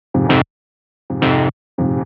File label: 110 Fm, Melodic Loops; M8; Electronisounds